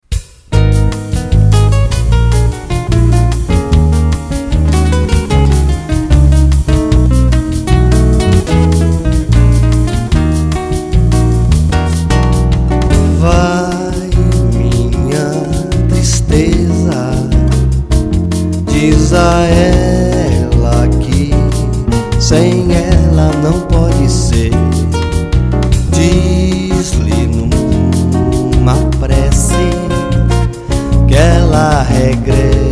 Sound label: Brazilian Jazz Samba